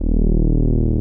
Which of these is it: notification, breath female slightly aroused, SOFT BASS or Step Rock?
SOFT BASS